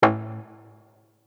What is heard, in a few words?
SYNTH HIT A; Volac